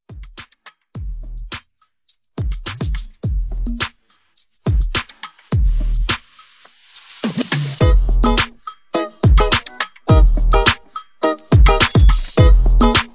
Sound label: Mashup; Remixfreakz